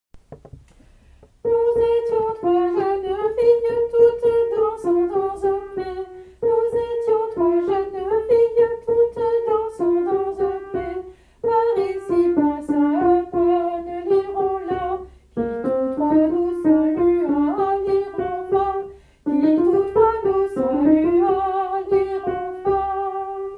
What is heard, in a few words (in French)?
Jeune fille alto